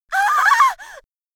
GRITO

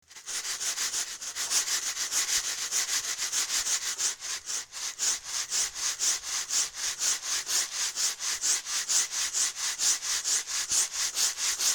conchas arrastrar

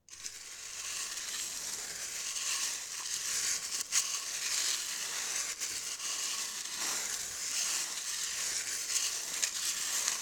conchas mano

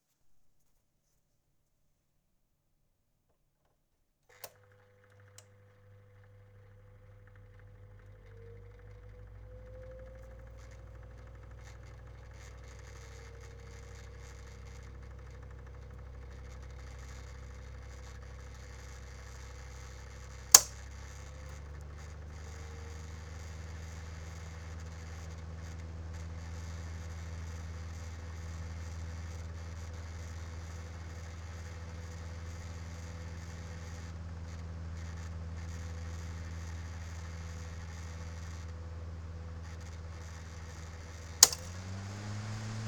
ventilador

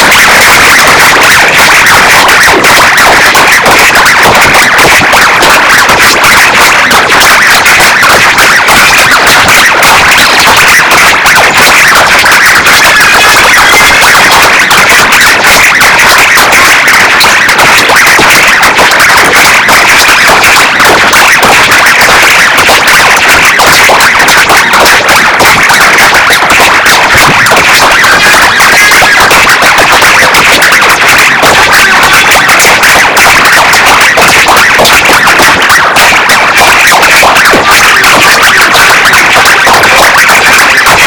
APLAUSOS; CASA SINTESIS

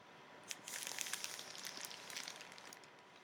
plastico roce

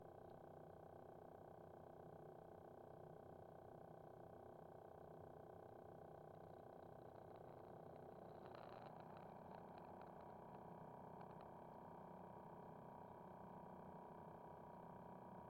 ventilador grande

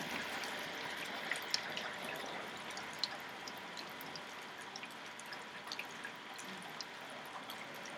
CASA LLUVIA

